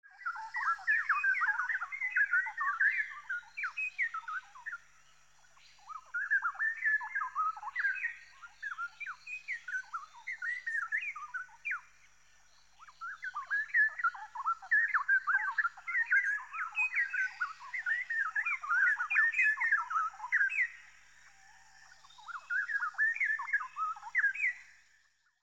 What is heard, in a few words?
rain forest single bird